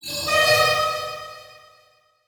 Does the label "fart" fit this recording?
No